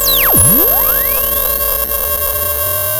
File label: sound of a bike bell